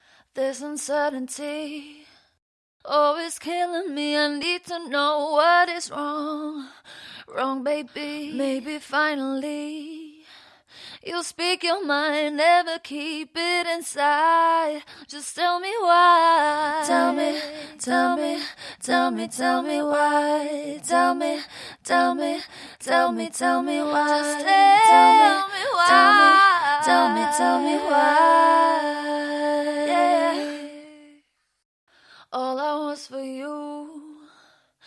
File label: pop rnb female 120 Dm, acapella